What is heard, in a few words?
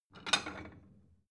plate small take slide soft